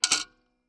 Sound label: PayPhone